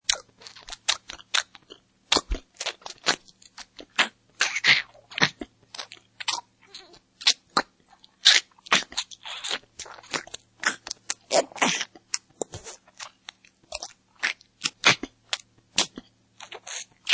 Monster Eating Flesh, Monster or fantasy horror creature such as werewolf or rabid monster dog; Monster Sound Effects; eating flesh, human meat. Chewing, slurping and smacking